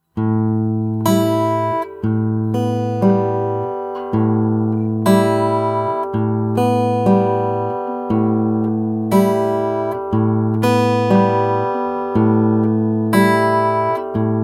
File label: Fingerstyle, acoustic guitar; alternating bass fingerstyle pattern; Nigel Wheeler